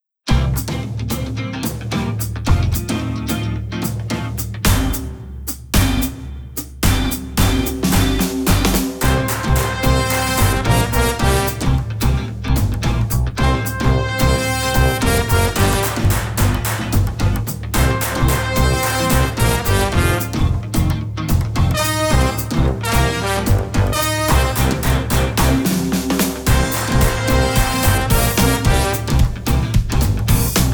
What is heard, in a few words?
Rhythm Sticks Activity; Resurrection